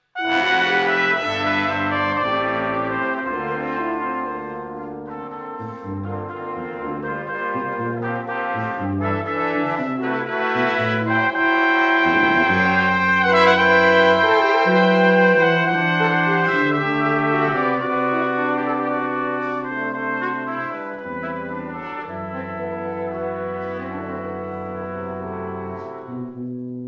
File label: Slow and Expressive, brass